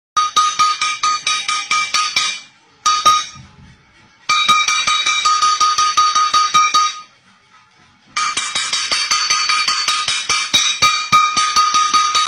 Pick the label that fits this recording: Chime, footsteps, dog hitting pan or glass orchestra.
dog hitting pan